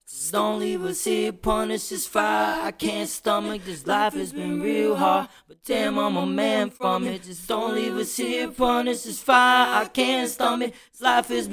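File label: hip hop, 133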